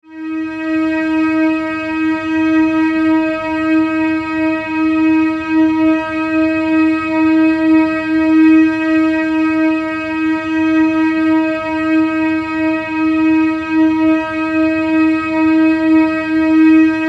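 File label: Medium D sharp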